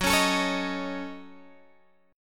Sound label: F sharp Minor 6th, Guitar Open F; F#m6 strummed; 18,20,21,20,18,x; chord shape